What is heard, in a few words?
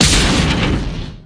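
HIT SMALL; 1997 MMX LAN PACK (win 95)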